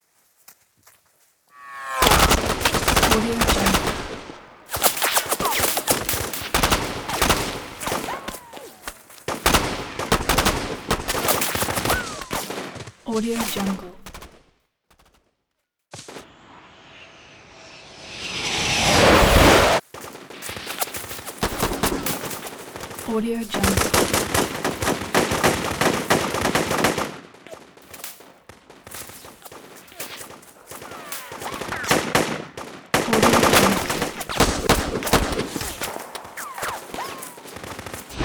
gun battle moderately busy weapon fighting

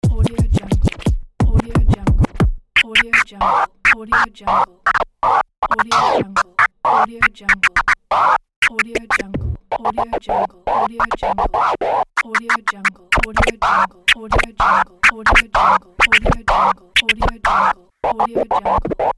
hiphop scratch

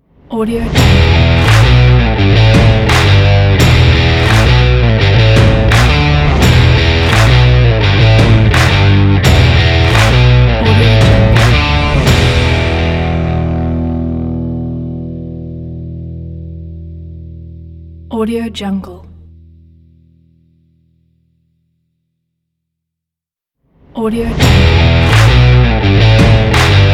stomp rock intro logo

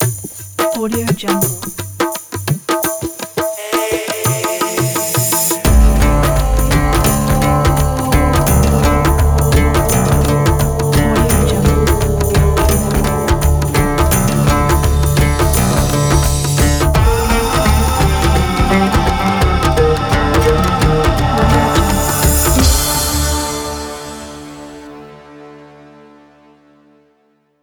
ramadan intro ident

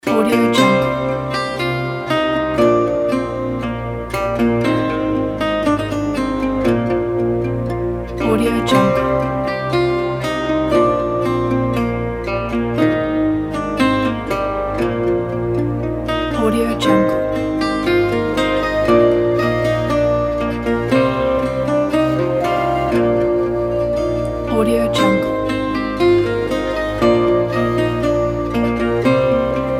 celtic ballad; huskystudio

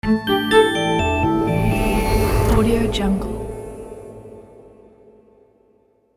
cinematic logo

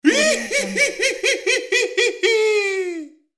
creepy clown laugh

